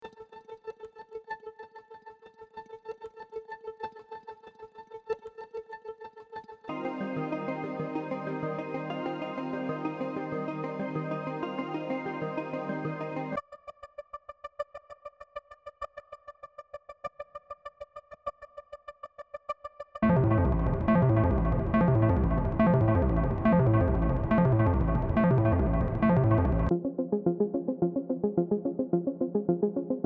High Pulses Synth

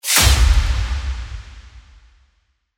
Hit; Aftermath; Dragon Slayer; All About Trailer Music

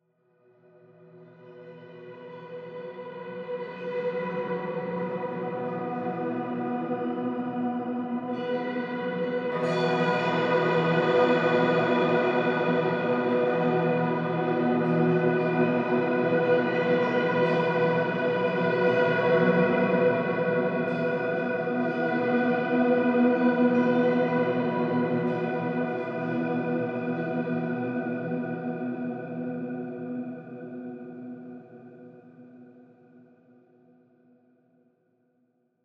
Harped Atmos B

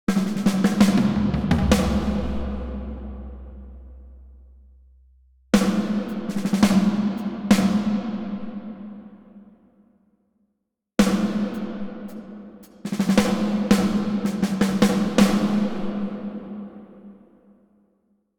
Drums St. Andrews Church

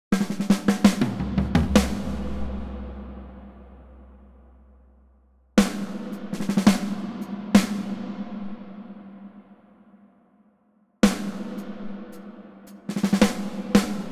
Drums Cathedral of St. Alexander, Kyiv; Co-Cathedral of St. Alexander

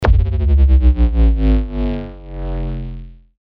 Downer Downhill; Aftermath

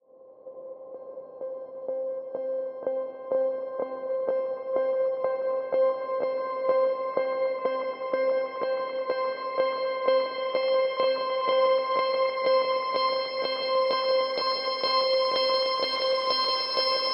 Bubbling Bells Pulse 125bpm C

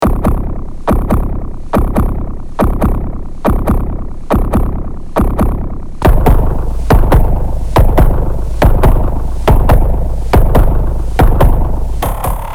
Tonal Perc

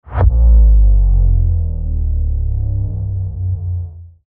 Downer Classic II; Aftermath

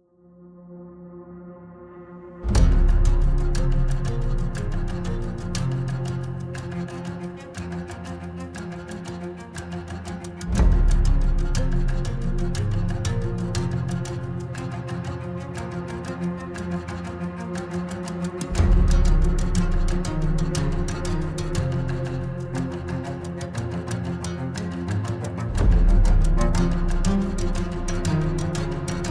acoustic

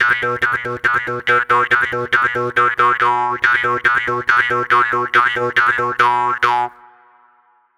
Jaw Harp

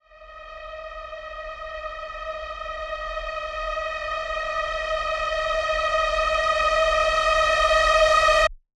Riser D; Resistor; VALENTINE

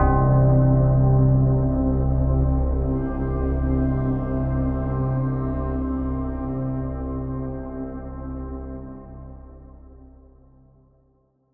Chimed Hit C

